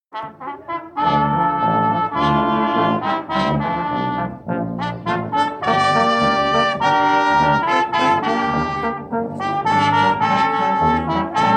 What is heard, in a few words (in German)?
Posaunenchor